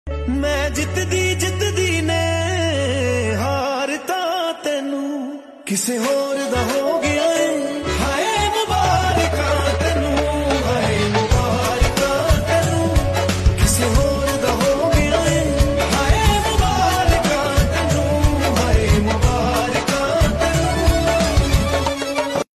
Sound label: Ringtone